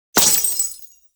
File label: Glass Shotgun Projectile Hit; Tenno